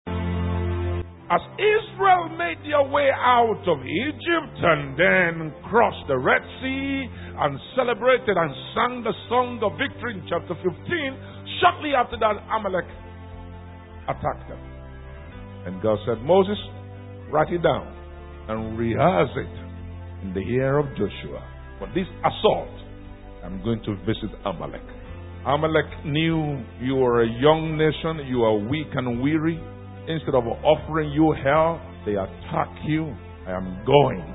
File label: ringtone